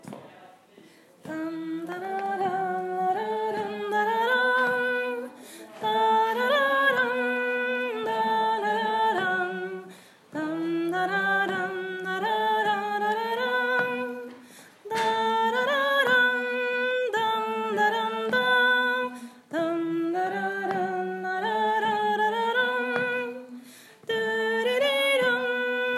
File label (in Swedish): Vals Trall Motmelodi B-del; Till Vännen Min